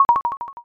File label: othello